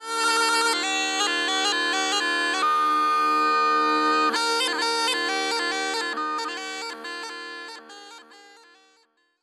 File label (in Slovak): gajdy